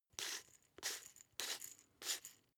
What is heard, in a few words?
glass pepper grind smooth sequence; berufe; gastronomie; soundpool